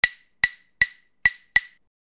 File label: RHYTHMIC